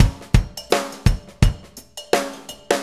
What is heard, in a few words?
Jim, Drum Tools, Loops; Sonic Foundry (Sony Creative Software); Loops & Samples Drum Tools